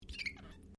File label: Glass Squeak Rubber